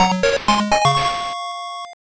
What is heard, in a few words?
Success; Pocket Monsters Advanced Generation Hiragana Katakana Kakechatta; Sega Pico